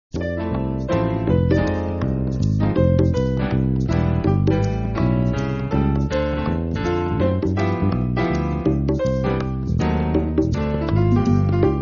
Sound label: swing; live band